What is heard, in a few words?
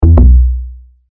wrong; robot knockdown